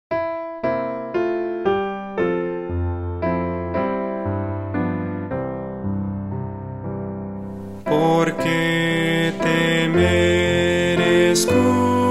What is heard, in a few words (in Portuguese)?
voz piano